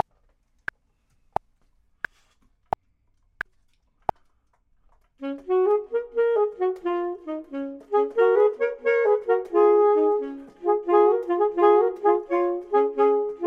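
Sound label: both, Saxophone and Clarinet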